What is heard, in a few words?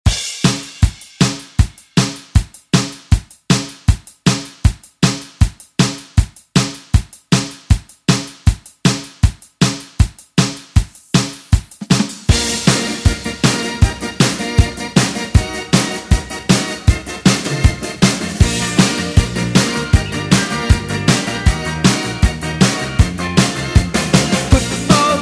80s ReDrum; DJ Mhark